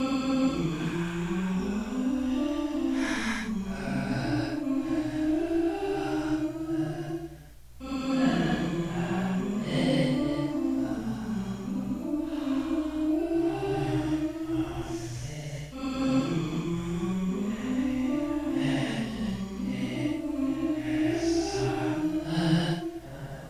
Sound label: spooky ambience